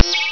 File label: button